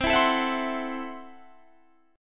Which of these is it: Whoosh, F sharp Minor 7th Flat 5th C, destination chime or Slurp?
F sharp Minor 7th Flat 5th C